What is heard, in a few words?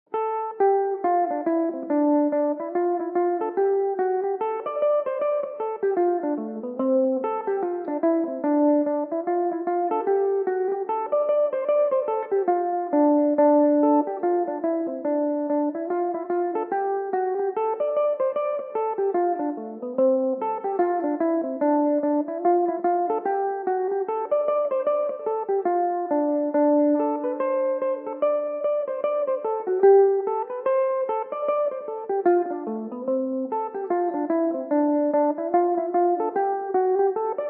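D dorian, irish mandolin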